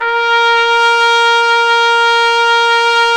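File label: brass, Tpt F, Solo Ambient; Roland L-CD702; Tpt 1-4 Solo; Tp 3 Ambient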